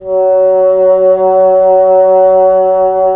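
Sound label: wind bassoon; LA Composer vol. 3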